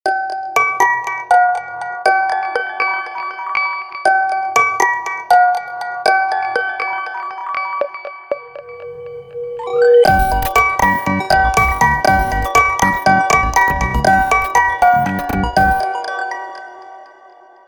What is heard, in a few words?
Ringtone; Infinix Zero 30